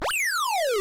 Checkpoint; SMM; SMB

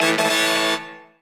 Ladder; Toad

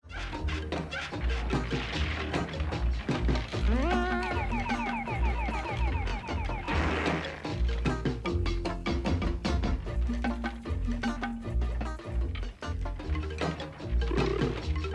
Instr; Motor City

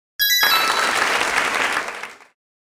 Rail Coin Complete; SM3DL

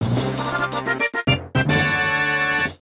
Music Boss Defeated; NSMB

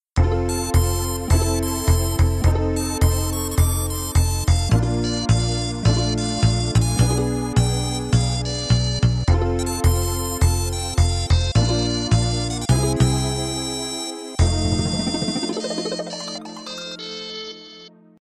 Game Over; DKC2; SNES

